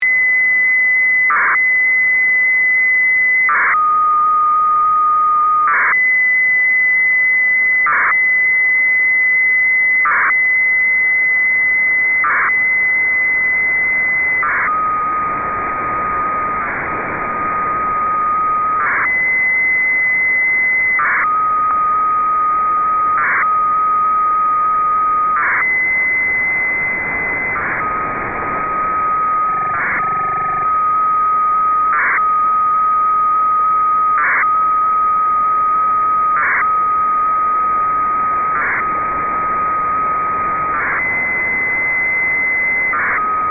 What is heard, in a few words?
SwissCube 437502kHz; Частота: от 437.500 до 437.506 MHz; Модуляция: BFSK 1200bps, USB